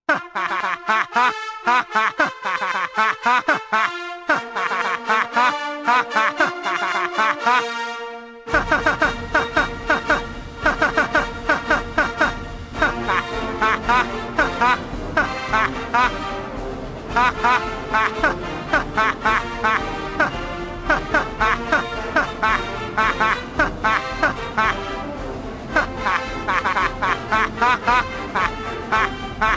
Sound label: laugh